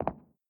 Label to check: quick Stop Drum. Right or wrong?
Wrong